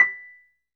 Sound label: Wurlitz EP B5; Sonic Reality - Vintage Timetraveler